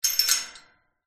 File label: industrial chain small thin wrapped around metal pole movements; zapsplat